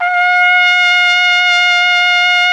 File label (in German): TROMPETE F 3, TRUMPET; Best Service; World Colours